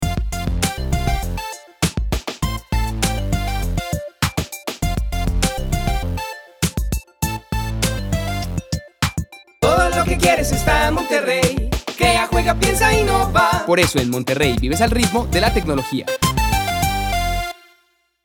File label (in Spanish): BRANDSONG PARA CUNAS CON VOCES